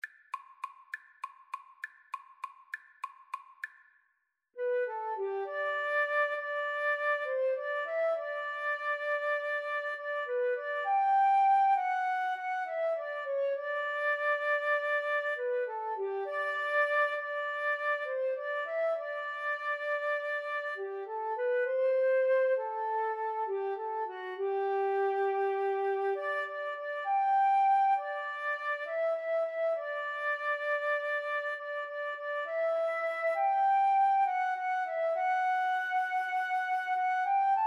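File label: flute violin karaoke; Violin-Flute Duet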